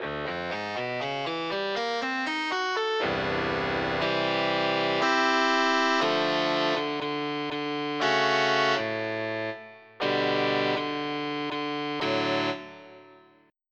Overdrive Guitar

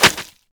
arrow flesh

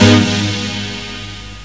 strg; The Atari Compendium (Toad Computers) (1994); music; synths

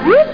floop

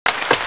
RUSTLE

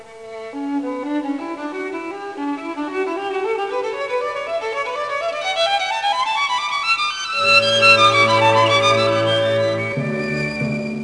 violi con

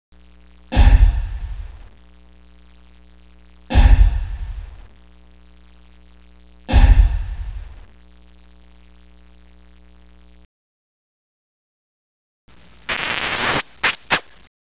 pound

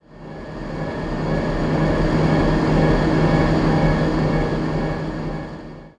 INDUST20, industrial; zones

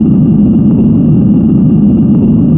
water machine; PC Gamer IT CD 41; Daikatana

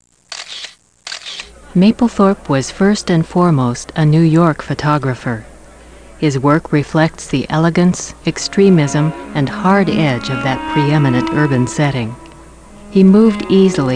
celeb; Robert Mapplethorpe - An Overview; music

